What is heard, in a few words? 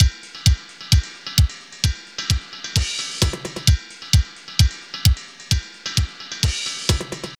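drum loops, right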